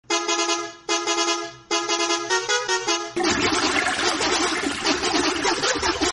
bus horn

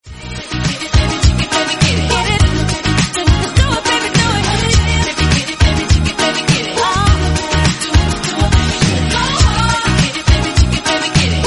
remix

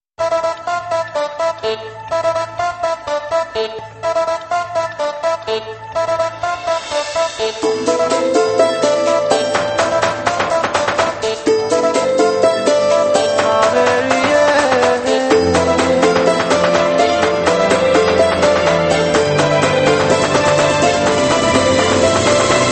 soft instrumental

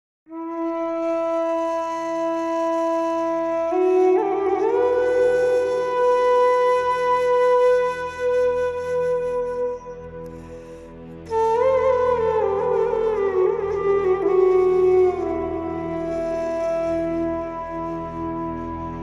flute music

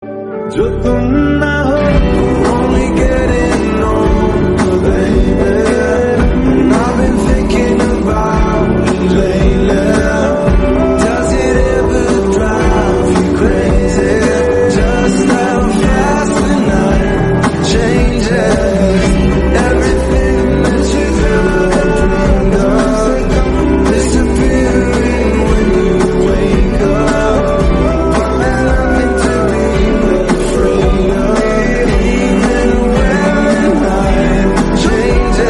slowed remix english

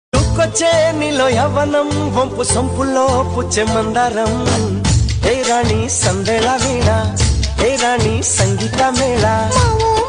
telugu song; ayudham movie

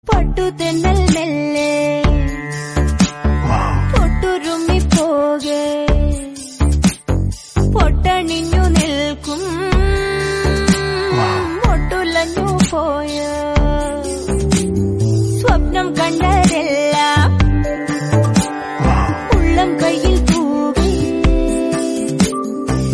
sad malayalam

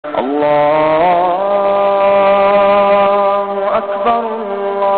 islamic prayer azan

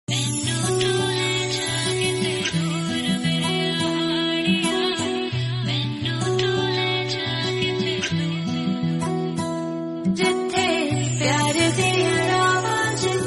punjabi song

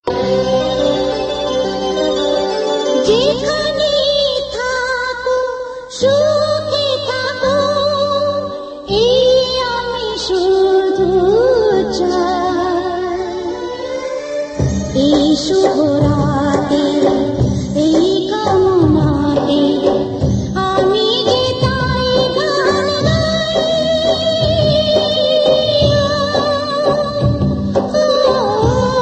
bengali sad

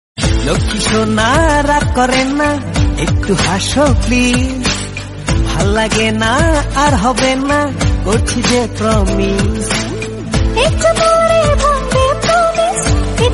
bengali song

